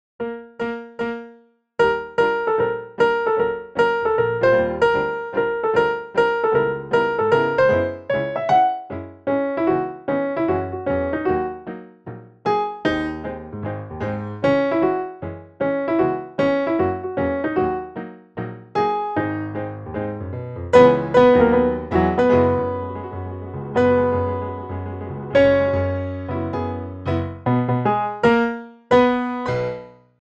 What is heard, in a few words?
plunk track